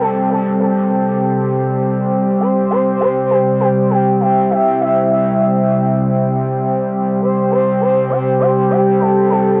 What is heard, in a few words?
Break Pad; пэды